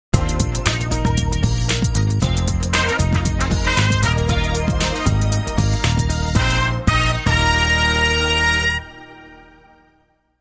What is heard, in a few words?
Breakbeat Brass Logo